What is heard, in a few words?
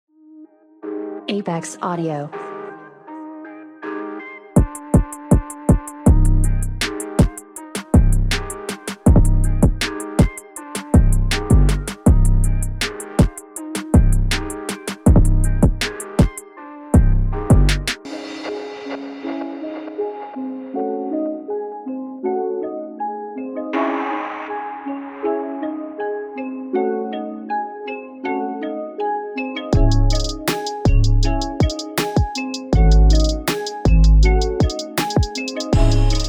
Trap Beats; Apex Audio; Faded